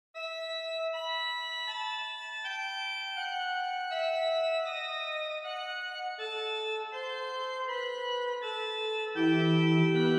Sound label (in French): Gloria Plein Jeu, OrganMusic; Beauvarlet Charpentier Journal; Souvigny